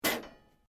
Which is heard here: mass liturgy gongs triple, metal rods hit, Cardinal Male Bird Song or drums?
metal rods hit